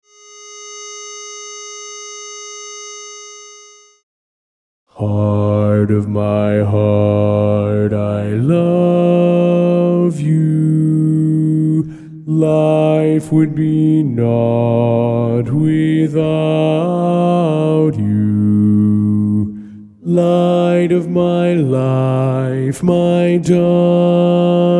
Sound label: Bass